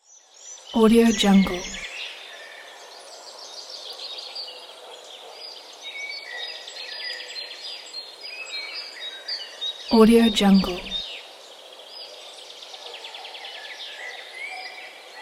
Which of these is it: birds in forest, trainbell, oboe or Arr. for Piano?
birds in forest